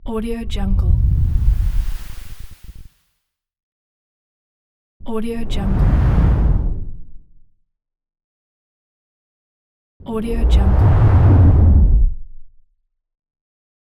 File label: rumble woosh pack